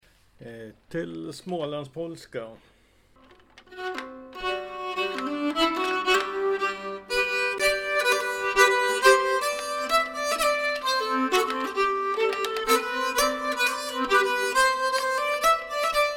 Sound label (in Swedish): polska